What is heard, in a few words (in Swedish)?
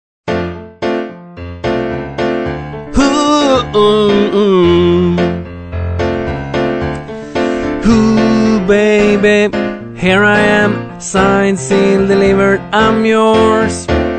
bas, stammor